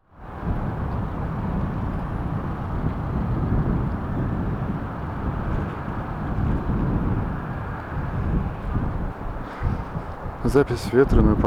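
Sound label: mke200 wind without protection, микрофон для камеры и смартфона; Sennheiser MKE 200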